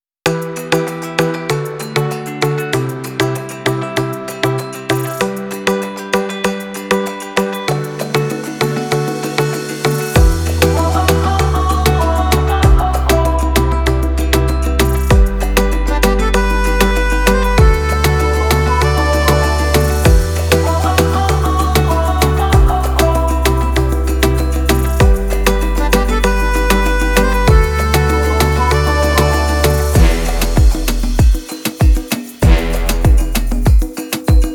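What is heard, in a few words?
ispanyol latin reggaeton; By Burak Sound Bank; latin ispanyol tema vol 1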